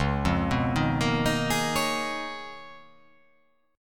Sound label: C sharp 13th C sharp